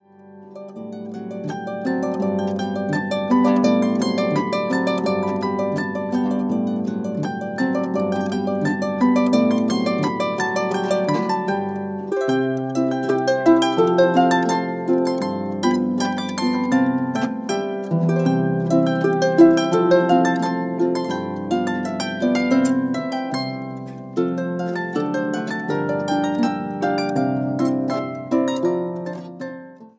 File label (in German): Harfenzupfer Boarischer